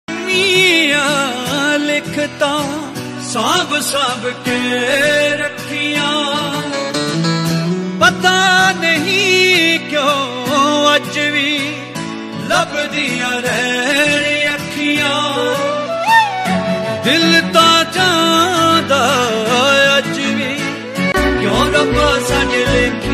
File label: Punjabi